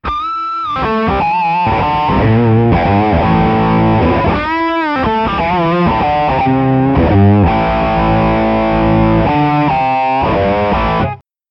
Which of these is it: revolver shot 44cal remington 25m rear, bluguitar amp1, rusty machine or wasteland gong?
bluguitar amp1